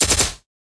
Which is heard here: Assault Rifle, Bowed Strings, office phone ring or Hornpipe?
Assault Rifle